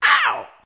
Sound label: compressed ow, voice